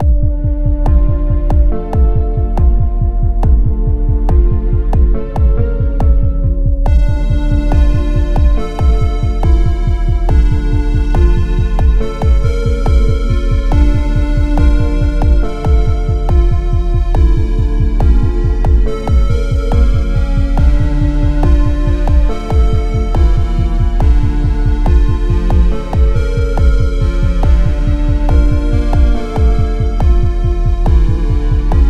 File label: dungeon